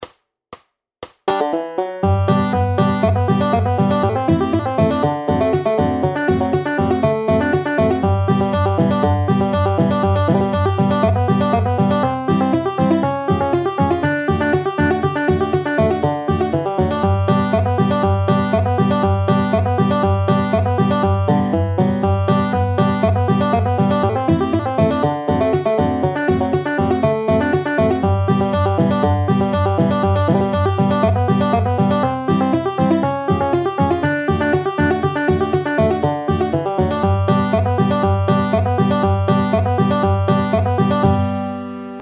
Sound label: Banjo; Bluenote